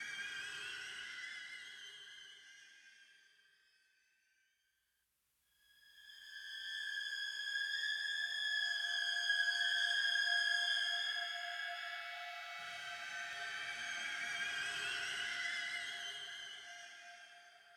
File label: Combat Loop; Harley Police